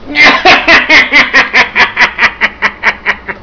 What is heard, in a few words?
EVIL LAFF